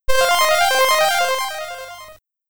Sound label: Jingle; Wizball; Commodore 64